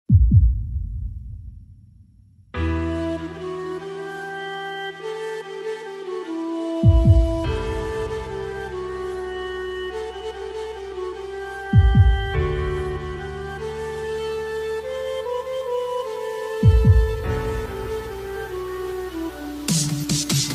heart touching ringtones telugu new ringtone sad ringtone